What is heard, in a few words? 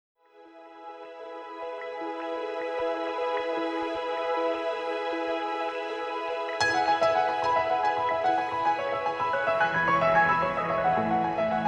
piano